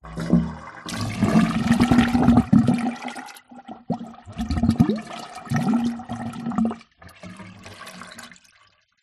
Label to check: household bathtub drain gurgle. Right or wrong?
Right